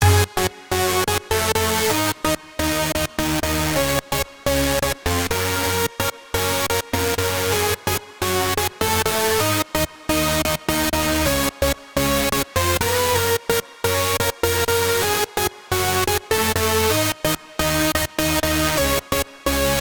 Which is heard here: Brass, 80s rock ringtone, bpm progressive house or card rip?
bpm progressive house